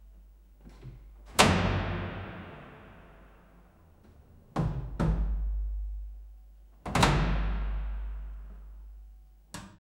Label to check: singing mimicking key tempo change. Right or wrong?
Wrong